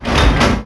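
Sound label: Click; PC Gamer IT CD 48; Big bang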